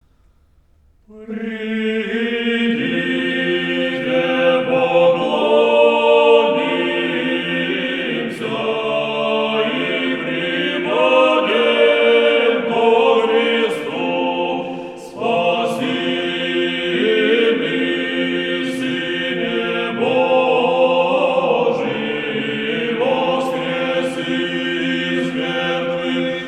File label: Come, let us worship, Strochnoi chant